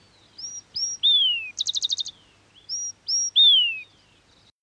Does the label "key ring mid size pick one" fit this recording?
No